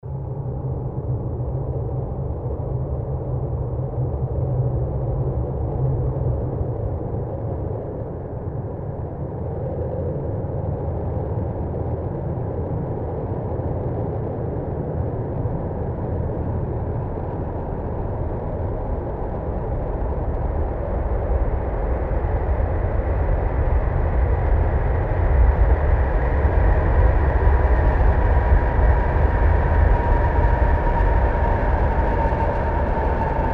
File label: ICE at Night rolling through woods; Davert; Ascheberg; ORTF